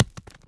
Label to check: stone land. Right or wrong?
Right